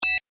Tech beep, Beep, High Tech; Stuart Duffield

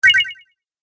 Game Spring; video game

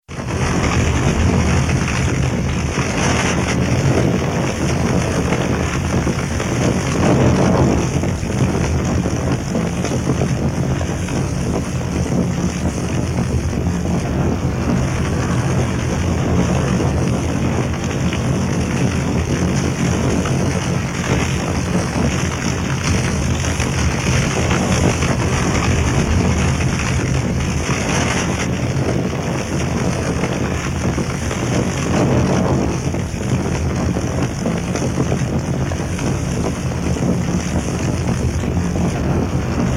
Large Wood Fire; Big fire roar and crackle; Furnaces; Household; Tom Hutchings

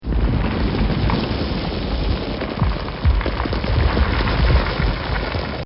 A Car On Fire; Cars; Vehicles; Bobby Cole

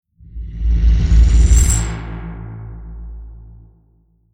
Stinger Alien Impact Reverse Heavy Distorted; Distortion; Sound Design; Tom Hutchings

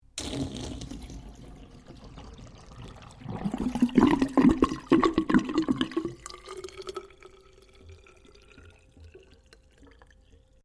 Water Bath Spa Water Empty; Domestic water movement; Nature

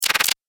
Machine Device Activate, science fiction

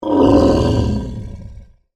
Monster Groan, Horror, Monster, Groan, Creature; Monsters; BlastWave

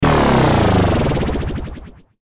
Descend Sweeper Burst; Descending Sound Effects; Blastwave

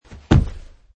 Landing; Man wearing jeans and hiking shoes jumping and landing on carpet surface - variation 7; Wrestling and Struggling; Michael Huang

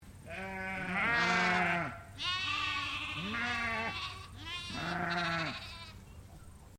Animal Sheep Dorper Group Baa; Sheep on a farm in Bright Victoria; Sheep (Sound Effects:Animals)

